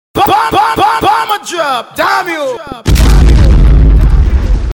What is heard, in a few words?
drop